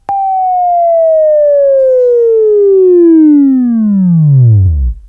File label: Buzz; Labview Program; Sweeping Training program